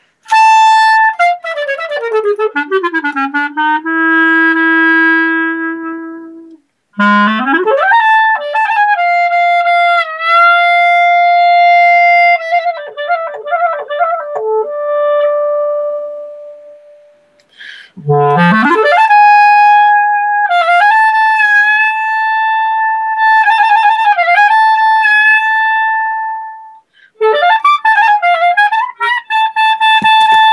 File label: Doina, Klezmer